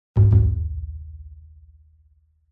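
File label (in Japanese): オープニング効果音（太鼓2打）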